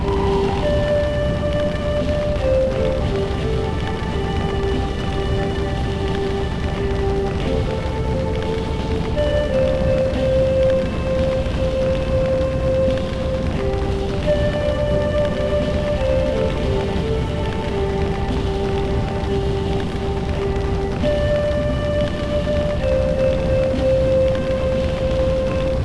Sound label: song; kivaunderground